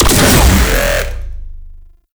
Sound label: Last Shot, Infested Arm Cannon, Weapons; Warframe; Coda Bubonico